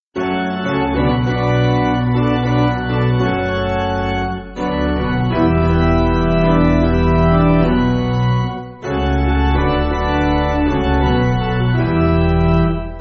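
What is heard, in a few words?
Piano, Music Backing; Feng Xiu Zhu; 5/Bm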